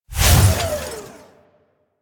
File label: Mech Melee Swing; Nechro Mech; Vehicles